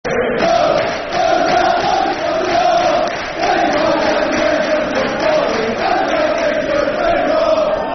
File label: Football Chant; Who's That Knocking On The Window; Huddersfield Town Htfc